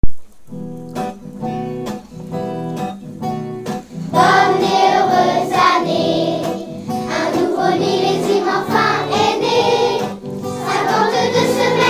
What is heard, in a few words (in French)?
chant Bonne et heureuse année